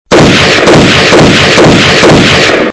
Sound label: autoshotgun